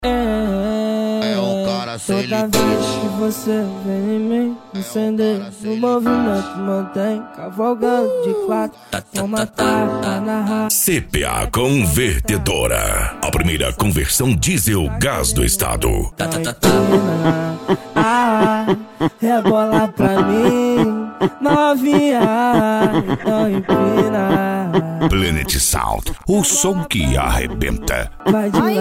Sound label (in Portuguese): funk ritmado; cuiaba mt